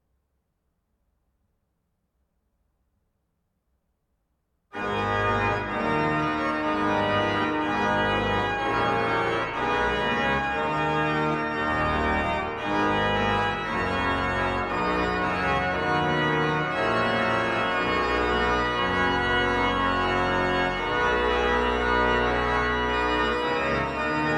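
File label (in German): Sei gegruesset, Orgue; BWV 768; Freiberg; J.S. Bach – BWV 768 – Partita en sol mineur: Sei Gegrüsset, Jesu Gutig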